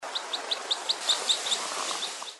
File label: flush; Red Crossbills; Bird Song Ear Training Guide